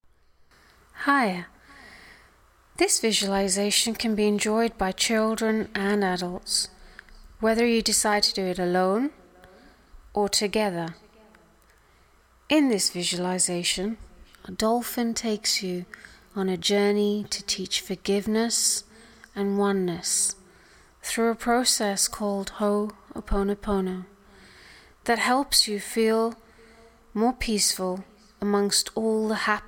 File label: dolphin meditation mix